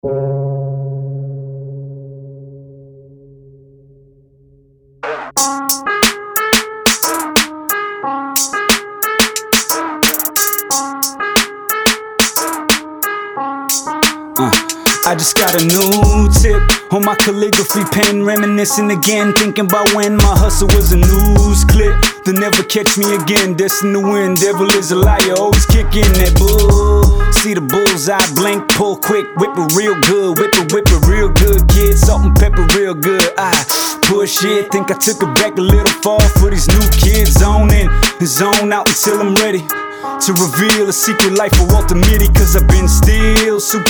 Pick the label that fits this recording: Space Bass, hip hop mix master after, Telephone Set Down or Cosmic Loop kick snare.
hip hop mix master after